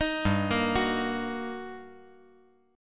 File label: G Minor Major 7th Sharp 5th, down